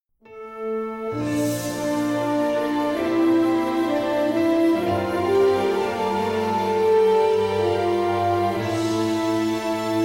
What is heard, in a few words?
Game Over